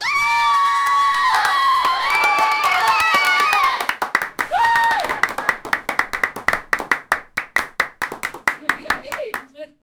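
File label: rhythmic energetic, cheering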